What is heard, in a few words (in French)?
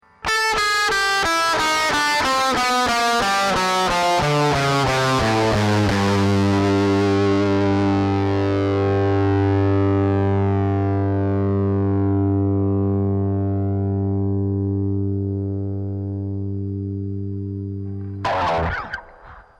disto gamme; gamme son disto depuis La 440Hz